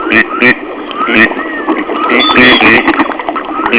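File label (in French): canard chipeau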